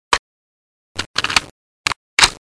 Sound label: g36 reload